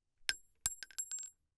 Weapon Bullet 3030 Rifle Shell Drop; AdobeCC

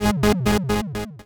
Sci-Fi Electronic Musical Pulse; Science Fiction

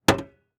Transportation Car Monte Carlo Open Hood

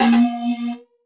Flash Beep Long; Multimedia Internet CD-Rom Flash

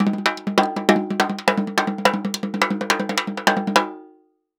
Cartoon Drum Beat

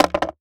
Skateboard Flip On Cement; Movements; Sports Movements

